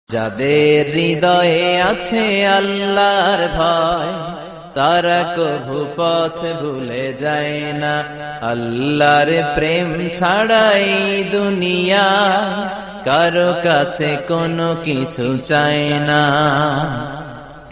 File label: islamic song